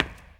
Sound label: step, glass; gameplay; materials